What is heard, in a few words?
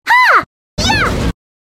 jump; princess peach